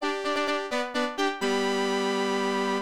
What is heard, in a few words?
Victory in a Naval Battle; Uncharted Waters New Horizons; Genesis